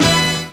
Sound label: HORNHIT; E-Lab; X Static Goldmine 2; akai